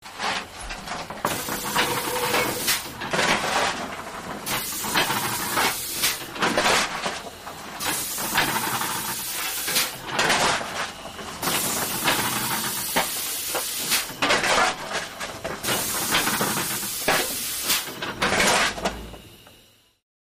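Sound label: Coal Fire Box Scooping, steam train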